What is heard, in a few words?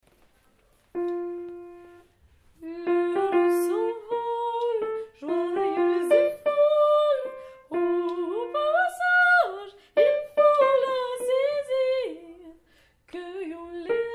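soprano; l heure s envole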